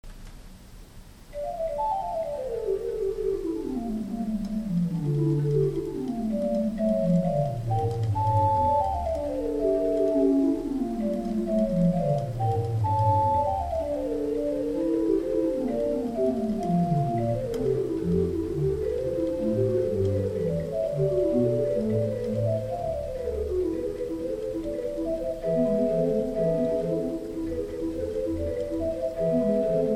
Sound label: Organ Swell gedeckt 8